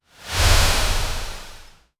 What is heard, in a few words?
dark trap sci fi whoosh